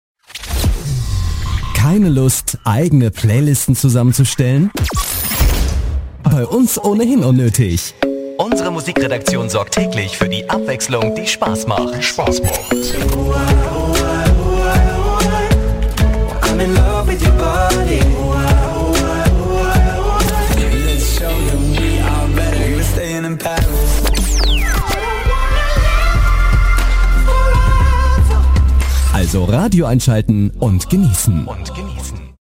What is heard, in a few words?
Jingle; RSN